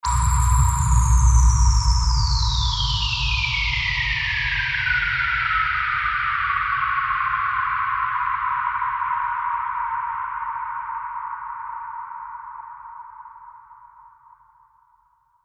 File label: Mountain Breeze Whistle Downer